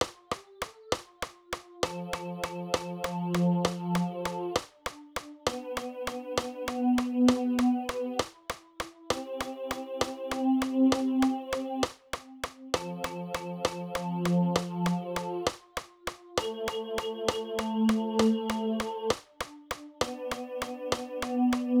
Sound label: Marana Tha basse; Ordinaires de messes; neufclocherslyon